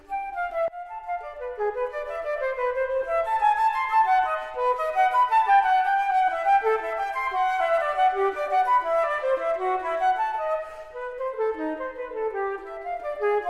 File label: flute